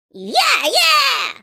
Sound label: upgrade Celebration; Tom; Talking Tom Gold Run